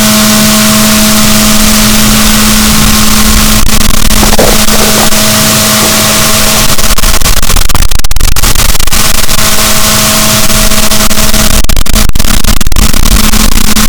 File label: rorquals negatif, boat